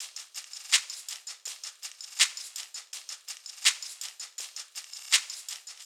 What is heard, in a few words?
Groove 82 bpm, Drums; Loops; Top; Sugar; Ableton Live 12 Lite; Core Library